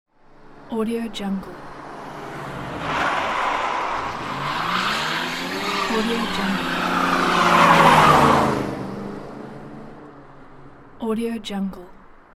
drift sound

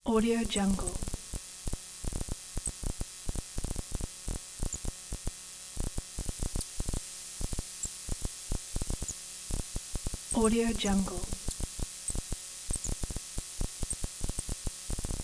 noise analog

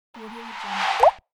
appear

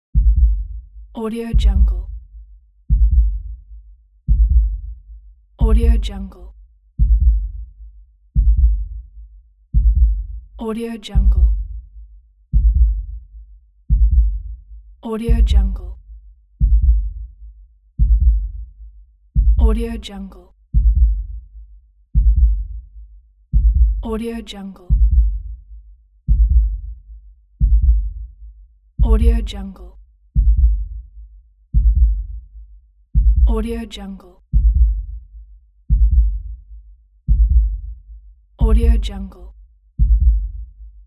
heartbeats

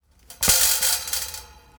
ball rolling in metal cage

